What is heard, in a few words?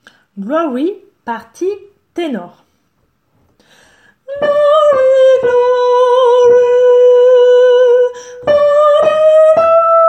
tenor